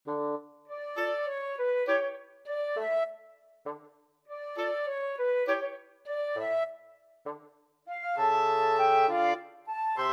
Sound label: marionette, wind quartet